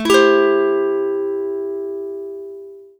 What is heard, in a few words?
Am7